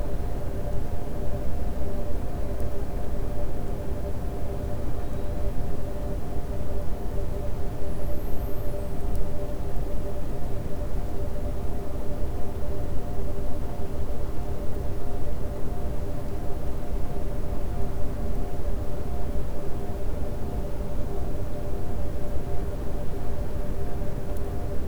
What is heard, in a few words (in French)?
cachalot positif, Physeter Macrocephalus